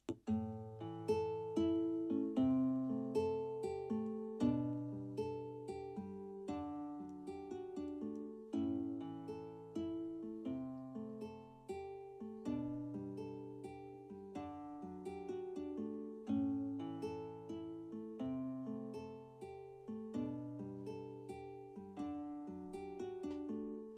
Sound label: karaoke